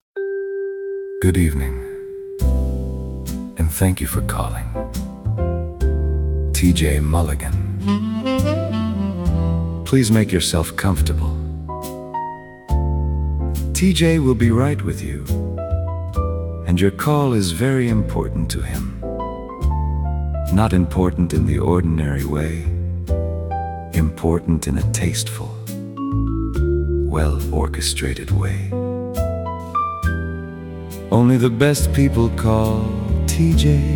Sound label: Sophisticated Lounge Jazz